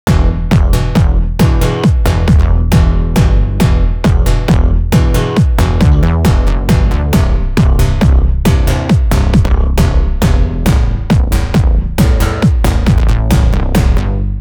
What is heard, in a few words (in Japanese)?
ベースの打ち込み